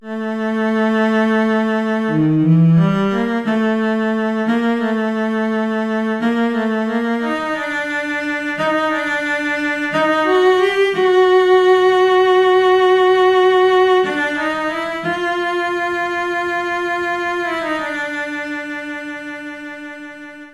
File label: cello solo unaccompanied